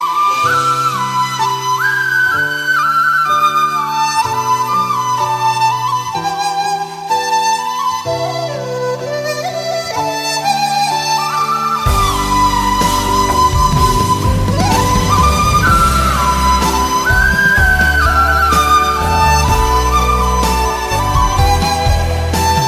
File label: emotional flute bgm ringtone